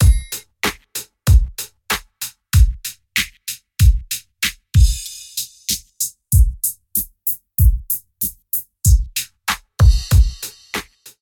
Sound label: Mid Cut Flt